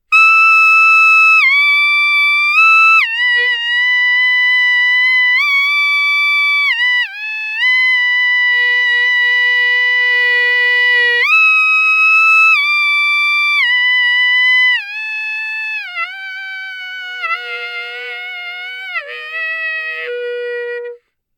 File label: metal clarinet